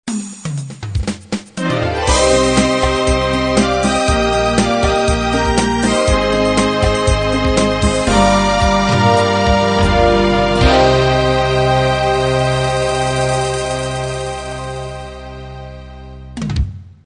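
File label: unplugged 8 beat end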